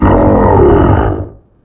biomech air vce die

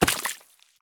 sprint puddle; Escape from Tarkov

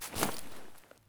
grenade smoke russian throw; Escape from Tarkov

